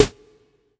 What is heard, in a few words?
Snare; Vengeance Samples